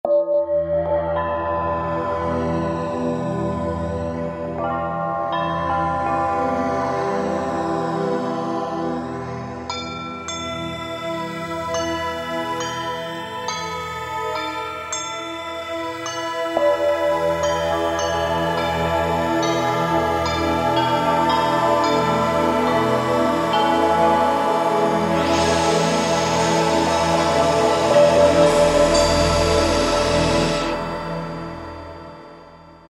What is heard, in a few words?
Tek, E-mu Morpheus; JavaTea